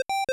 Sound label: Chicken; mother2